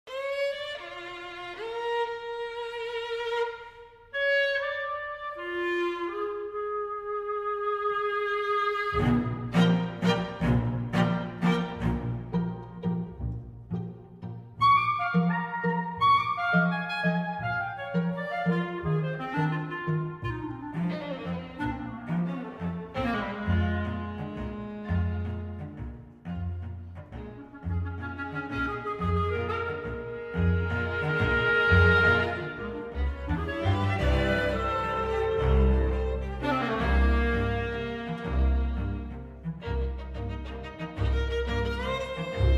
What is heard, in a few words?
Preludes for Clarinet and Strings